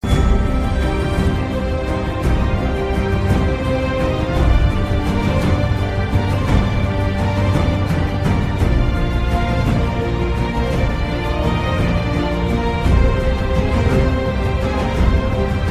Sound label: Ringtone Piano; Game of Thrones